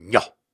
Grunts and Chants, Viking Vocal

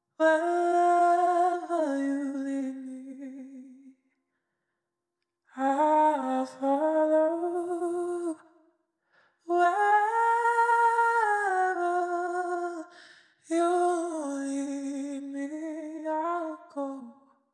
Vocal Phrase, 98 BPM A# Min; I'll Follow; Cymatics; Infinity Vocal Collection

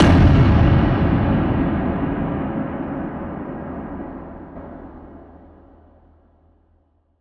Future Bass Impact; FX; Impacts; Cymatics; Cymatics - Future Bass Starter Pack

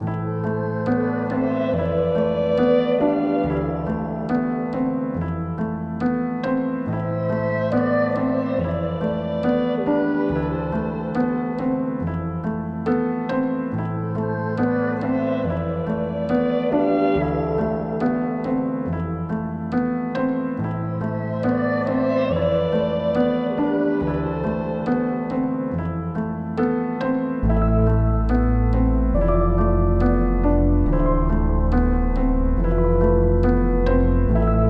140 BPM A Min, Melodies; Last Of Us; Cymatics; Immortal Production Suite Beta

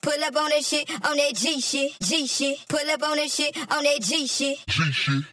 Processed Phrase - Pull Up On That - 90 BPM; Vocal Phrases; Cymatics; Euphoria Vocal Sample Pack

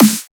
Dubstep Snare; Ghosthack; Dubstep Sound Arsenal

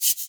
Cabasa Large, World Percussion; Cymatics; Emperor World Percussion

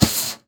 Steel Brush, Snares; Ghosthack - Free Snares 2023

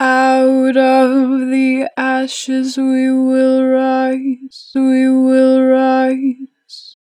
Natural Vibrato, Vocals, 4 bar vib; Ashes; Harmor Vocals

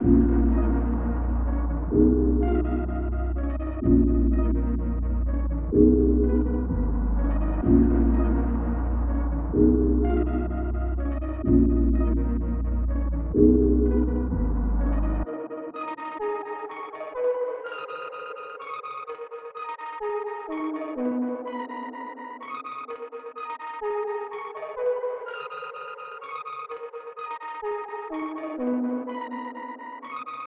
126 BPM D# Min, Melody, Trap; Cymatics; 2022 Melody Collection; Trap Pt 1

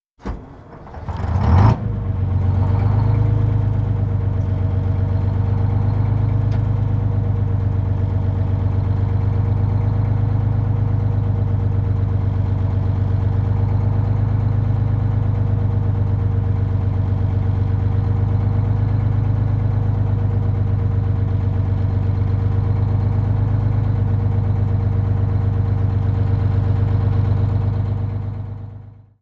Bus Start Idle; Vehicles; Cars & Trucks

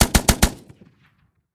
Freeze, Guns

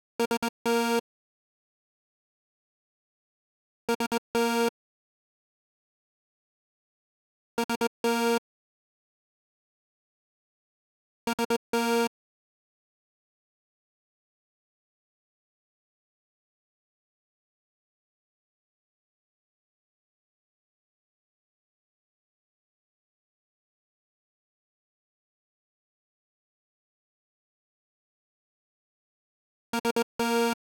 130 BPM D# Min Prophet Lead; Melody; Loop Stems; Cymatics; 2022 Melody Collection - Wavy; Run Dat

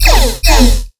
Riddim Bass Hit E

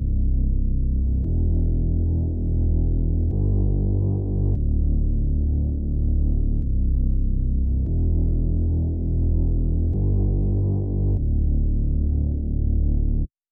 145 BPM E Min Bass; Loop Stems; Cymatics; Utopia

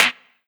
Clap, Synthesized; One Shots; Ghosthack; Drum Hero 5

